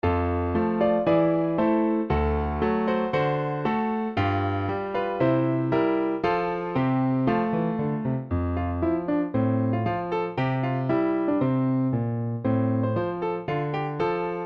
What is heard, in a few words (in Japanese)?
校歌（ピアノ）; 木曽呂小校歌